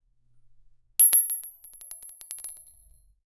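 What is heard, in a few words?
ring falling from a height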